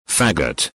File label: fagot